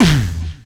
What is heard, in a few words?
VOC DRUM, MOUTH PERC; Best Service Hyper Dance